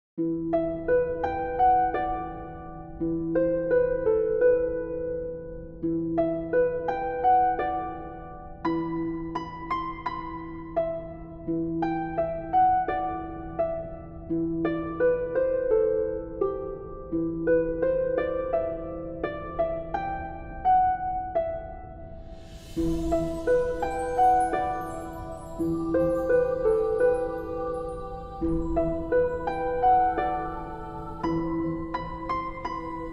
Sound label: Magical Cave Music Dark Caves Dark RPG Mysterious